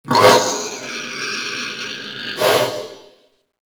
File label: wretch tunnels sight; A Machine for Pigs